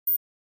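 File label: Flash Beep Low Electronic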